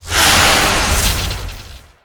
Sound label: Whirlwind Tempest Death